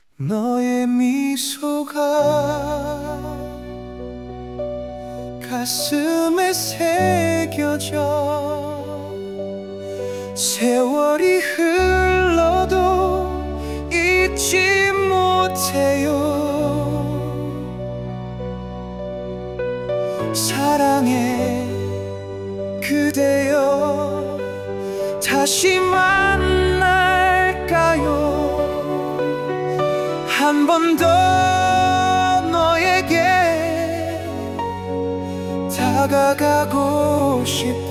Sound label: 90s ballad M; acestep